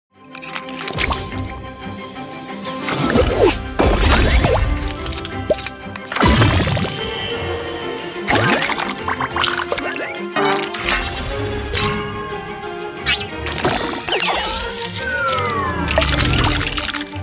Mobile games

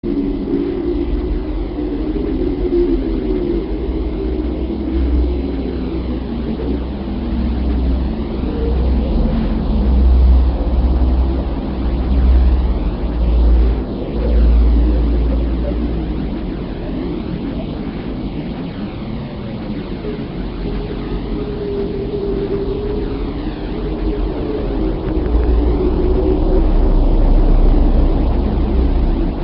Symphony of planets

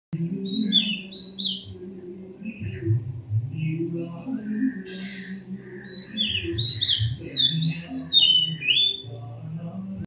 Oriental magpie robin

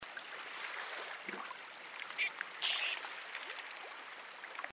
Fairy prion

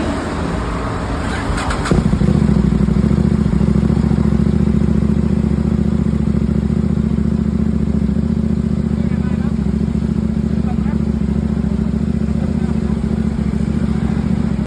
KTM, اگزوز

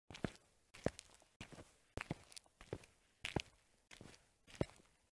asphalt

